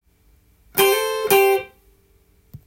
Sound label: blues, guitar; blues short phrases tab